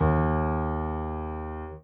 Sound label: soft hitwhistle